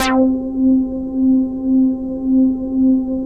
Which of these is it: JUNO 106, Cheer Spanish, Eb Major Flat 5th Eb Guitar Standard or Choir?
JUNO 106